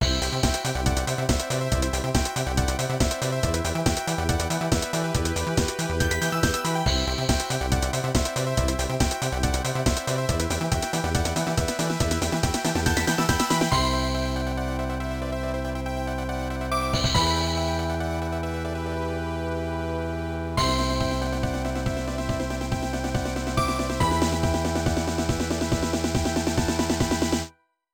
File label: Trance; Sony Ericsson